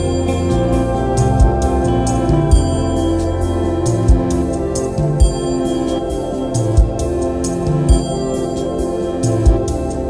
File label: Music Ringtone